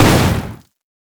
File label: missile barrage normal; LoL; corki